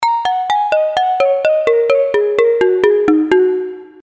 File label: X3R Logs